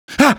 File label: pain, male; Half-Life 2